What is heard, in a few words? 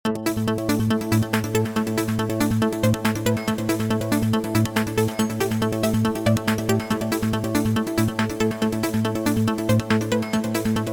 korg wavestation sr sounds drummer; Korg Wavestation SR Pure - Drummer III